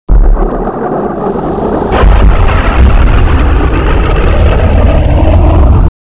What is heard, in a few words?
incomings, ambience